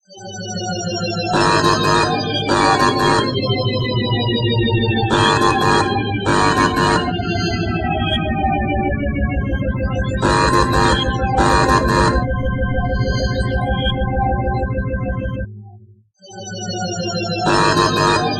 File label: iPhone6 Clock Alarm